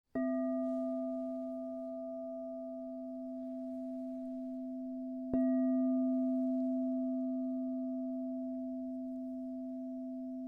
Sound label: Crystal Tones Singing Bowls